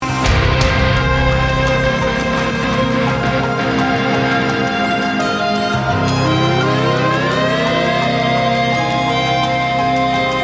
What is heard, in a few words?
Instrumental